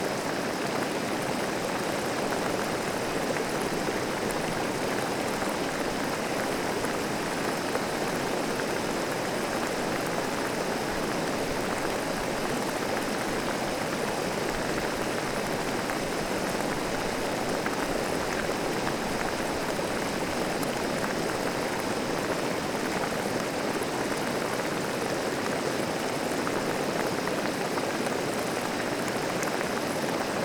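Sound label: Water Bubble Water Boil; water sounds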